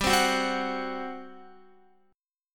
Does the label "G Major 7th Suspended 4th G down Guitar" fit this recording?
Yes